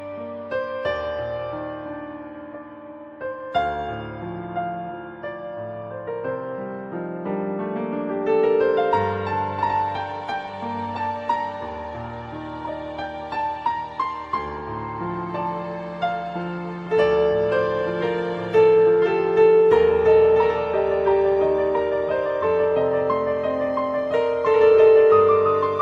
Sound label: Best Romantic Instrumental Ringtone